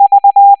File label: v tone